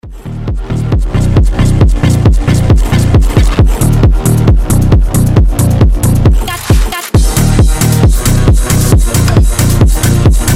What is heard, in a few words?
techno remix, brazilian bass